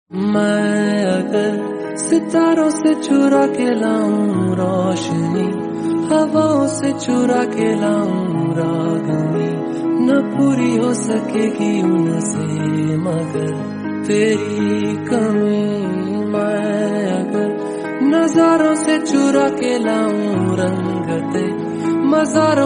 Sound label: bollywwod indian romantics, ringtone